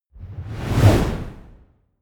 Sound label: whoosh air